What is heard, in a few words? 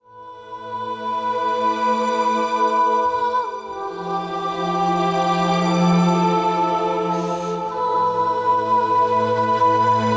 epic sound; attack on titan